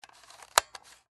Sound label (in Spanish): juguetes juguete